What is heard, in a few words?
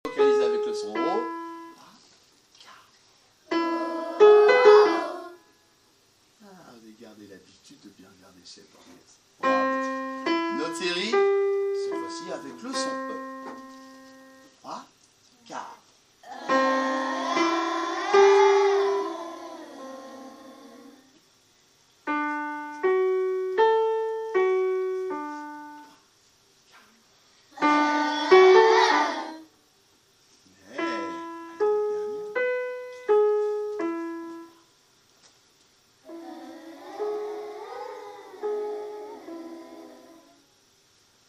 Vocal; un nouvel instrument a vent le tuba; Vendredi, avec Freddy, nous avons poursuivi nos séances de musique.